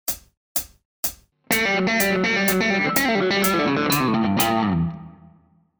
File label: lick